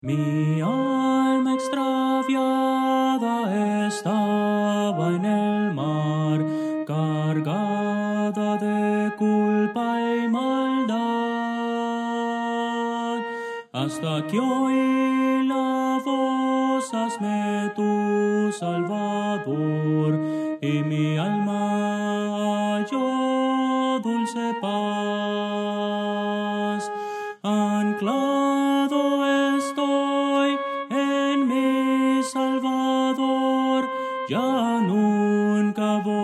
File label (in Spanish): mi alma extraviada estaba en el mar soprano